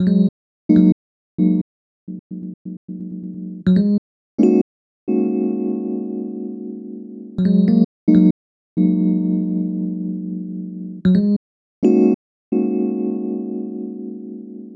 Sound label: Keyboard